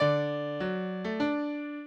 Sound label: minuet, mozart